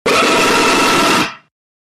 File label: jumpscare; barney